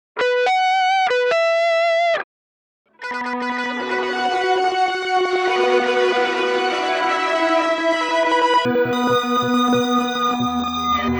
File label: Pitch Delay with Half Speed